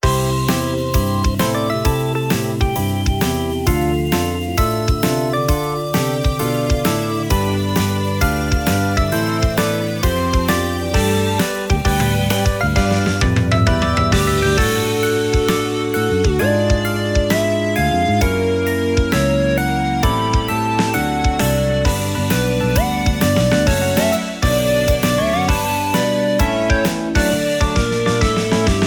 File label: Jingle Joyful; Taiko no Tatsujin V Version; PS Vita